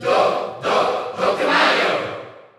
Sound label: Cheer Dutch; Dr. Mario; SSBU